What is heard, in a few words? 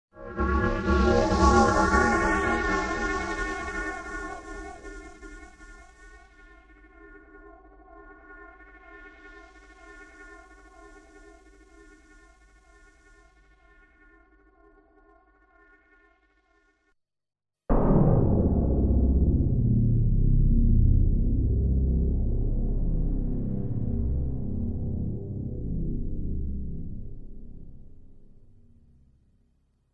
cs80 string; Strings 2 demo